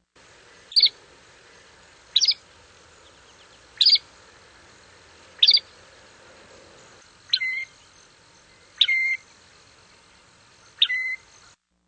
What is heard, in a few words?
shrike